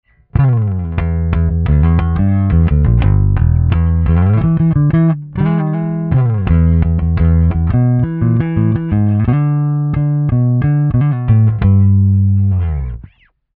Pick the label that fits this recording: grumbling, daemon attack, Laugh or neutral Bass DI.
neutral Bass DI